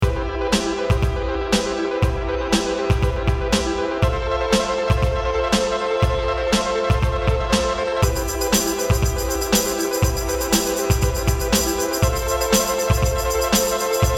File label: arpeggio sampling; weeklybeats 2012